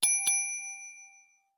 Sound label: Ding Ding; Wii